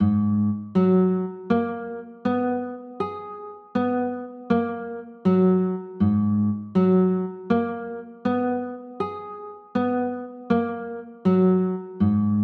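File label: G sharp m6 chord, guitar; g sharp m9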